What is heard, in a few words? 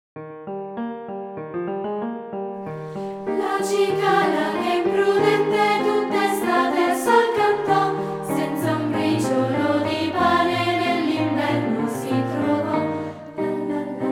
school Choir